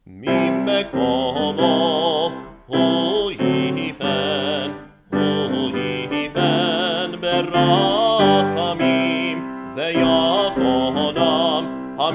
Mimkomo Traditional

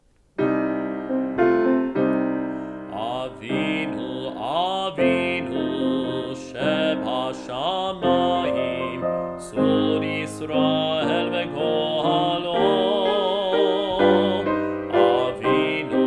Avinu Shebashamayim

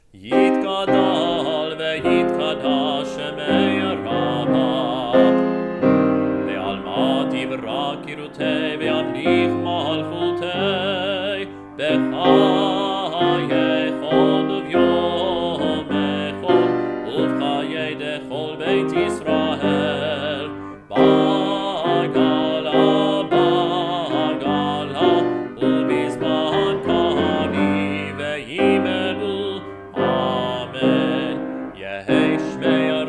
Chatzi Kaddish

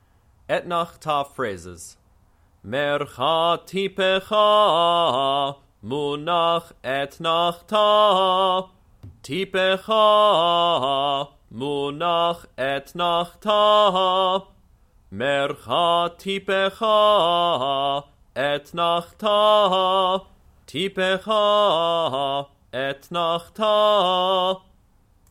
Haftarah Trope Etnachta Phrases; Kol Ami — The Northern Virginia Reconstructionist Community

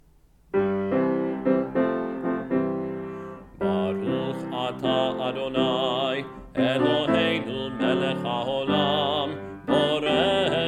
Friday Night Kiddush

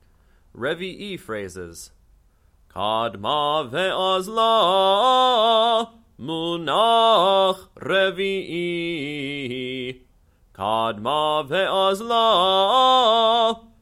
Torah Trope Phrases; Rvii; Kol Ami — The Northern Virginia Reconstructionist Community

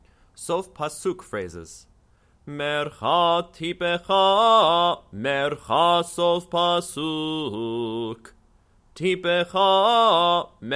Torah Trope Sof Pasuk Phrases; Kol Ami — The Northern Virginia Reconstructionist Community

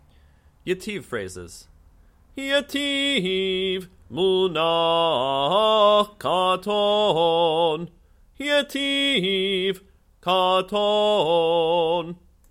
Haftarah Trope Ytiv Phrases; Kol Ami — The Northern Virginia Reconstructionist Community

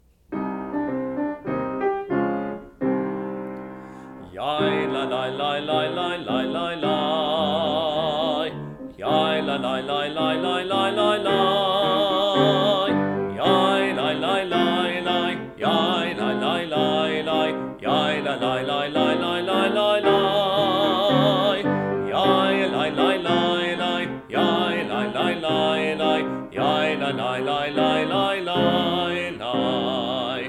Barchu; Family Minyan

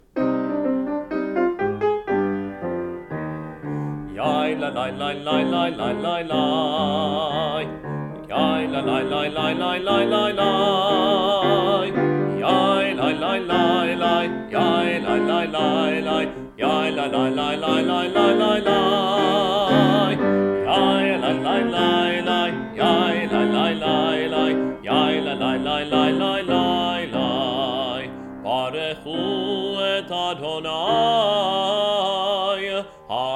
Barchu

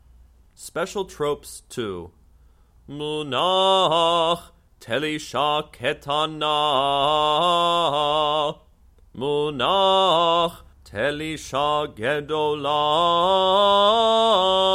Torah Trope Special Tropes

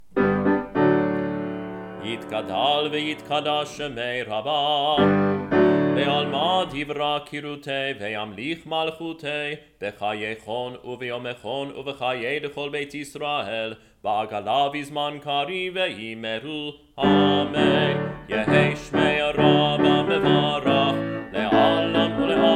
Kaddish Shaleim

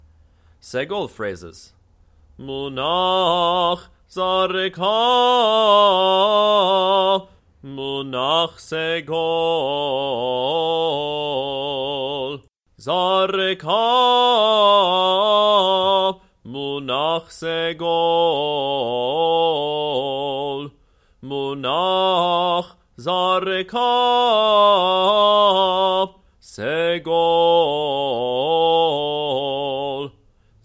Haftarah Trope Segol Phrases

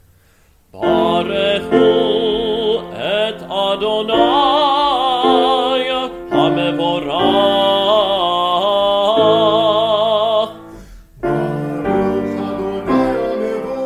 Barchu

